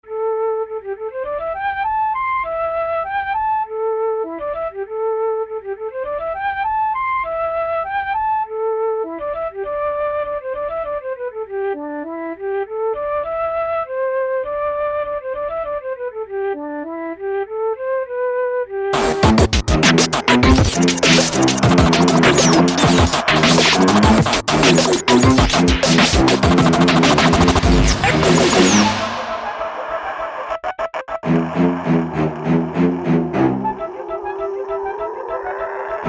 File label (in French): Concerto Pour Flute